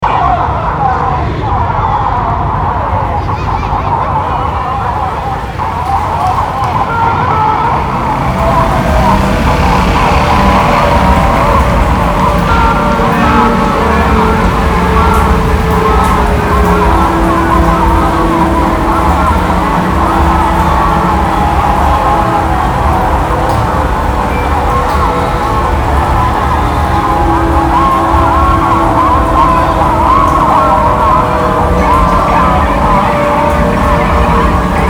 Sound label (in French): la plage de mer